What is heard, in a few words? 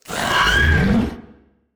Monster Screeching; Game rip; MiSide